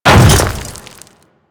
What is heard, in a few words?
Hammer Boss Step Dirt, footsteps; Warframe; Grineer